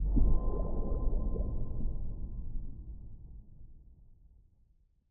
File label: spin start; netent